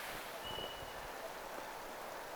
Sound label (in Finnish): yksi niistä kapustarinnoista